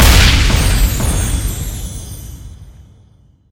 multiplier High Land; starburstxxxtreme

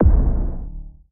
cocoon Land; butterfly staxx 2

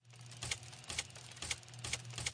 free Spin Reel; steamtower

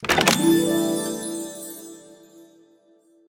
unlock; finn; Casino

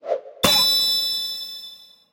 random Wilds Fall Down; finn

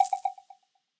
bet Change; starburstxxxtreme

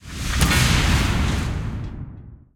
super Mega Win Attention; steamtower

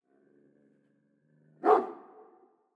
ambience dog; dead or alive 2

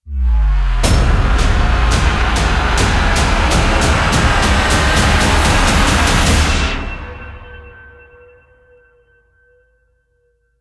near Win Riser; warlords

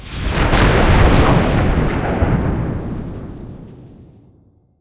extra Free Spins; parthenon

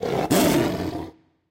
panther fx high; serengetikings

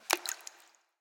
gem Splash; imperialriches

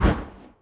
bonus End; the wolfs night

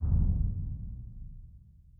reel stop; rise of maya

